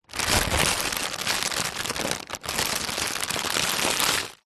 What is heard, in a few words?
foley plastic bag thick handling; zapsplat